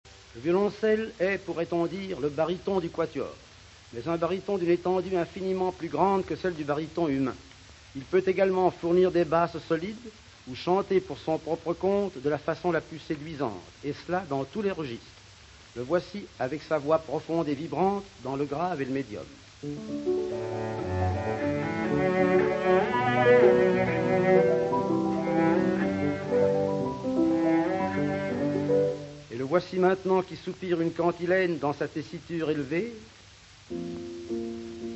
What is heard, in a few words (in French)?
orch violoncelle